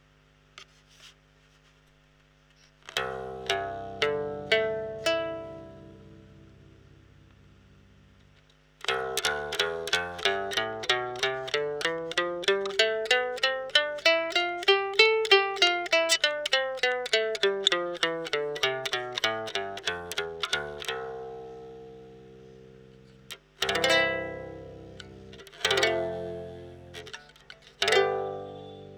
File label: Guitalele nylon CGDAE RAW PIEZO